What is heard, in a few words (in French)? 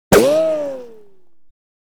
Balle ricochet; BRUITAGES